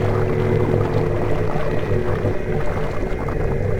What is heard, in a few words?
Sci-fi Slime Hum Loop; SFX Kit; Sci-Fi Loops